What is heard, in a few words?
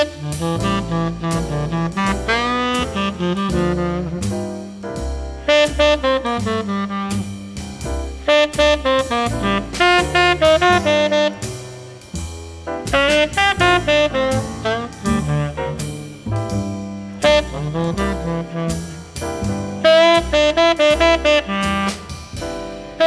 jazz